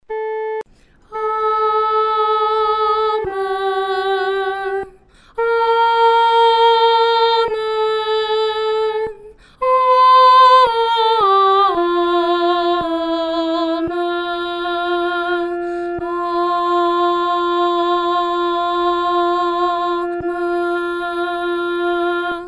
阿门颂 独唱（第一声）; 阿门颂（三）

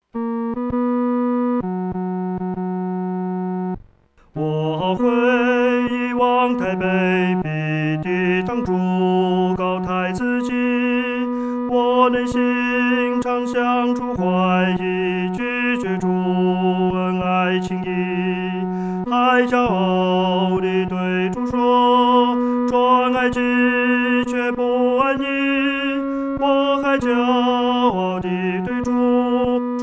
独唱 第三声; 何等痛悔

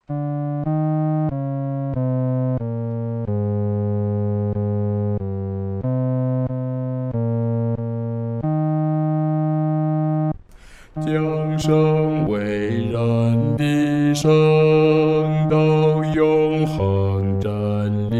圣道化身 独唱（第四声）

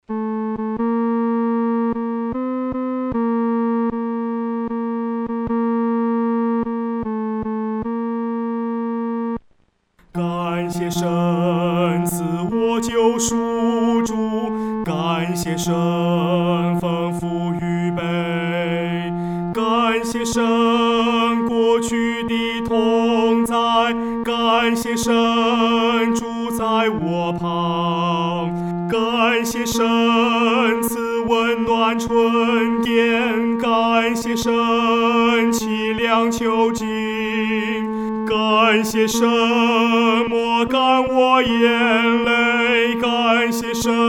独唱 第三声

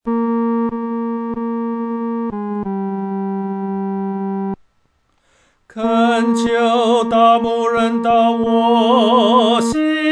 独唱 第三声

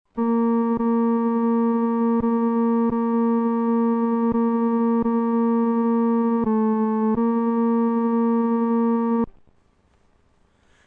独唱 第三声

